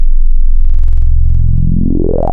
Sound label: 303 Acid